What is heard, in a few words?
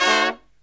LOW CHORD, Killer Brass Riffs; USB Soundscan vol.29; AKAI